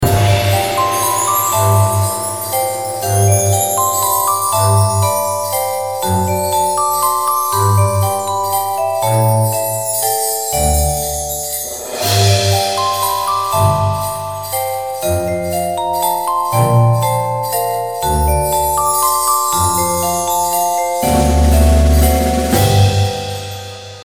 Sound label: music